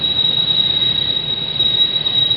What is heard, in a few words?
Rub, Train; OpenBVE